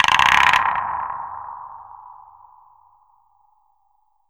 GUN TOYS; USB Soundscan vol.27

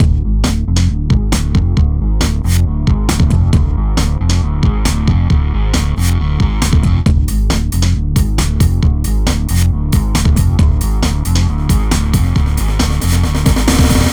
Hardcore Breakbeat, 136 Dmin; Big Fish Audio; Hardcore Breakbeat Action; Mission

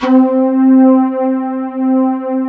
CHIFF STRS

